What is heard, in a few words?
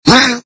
pain, paralyzed subject